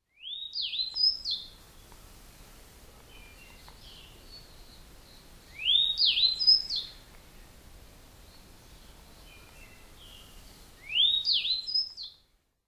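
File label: Grey Bunting; koe; osado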